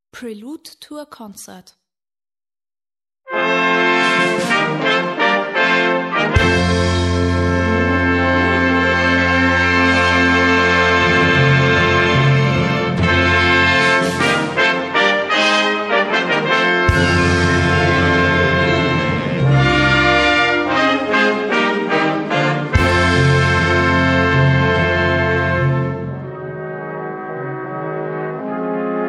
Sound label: Prelude to a concert